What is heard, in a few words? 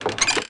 trap